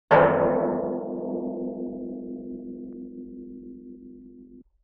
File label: Oil Drum Hit Deep Sustain; Vintage Cartoon; Drums & Percussion